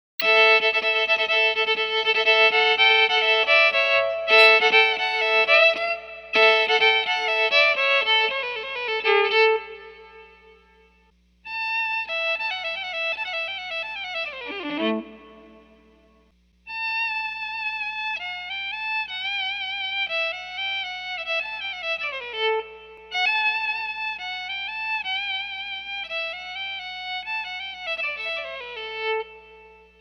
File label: Electric violin; Silkyn Tube Amp